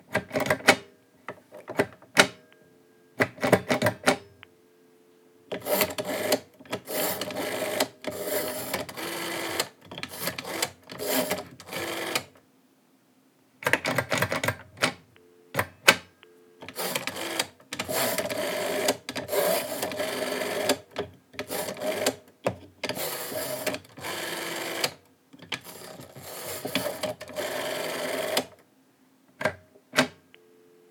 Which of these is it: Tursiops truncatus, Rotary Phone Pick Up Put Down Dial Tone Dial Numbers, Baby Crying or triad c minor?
Rotary Phone Pick Up Put Down Dial Tone Dial Numbers